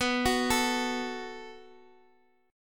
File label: B 5th 7th B, chord; B57 Chord {X X 9 11 10 X} arpeggiated